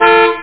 car horn; vehicles

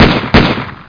PISTOL; MPG-Net (USA); garden

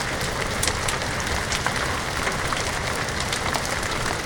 rain atmo

